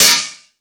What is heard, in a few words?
Dance 2000, Drum Machines E-MU Pro-Cussion